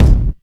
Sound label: smooth kick one shot f key; kick drum samples